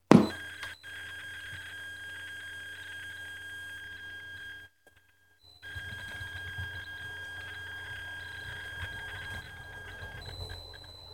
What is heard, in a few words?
Startup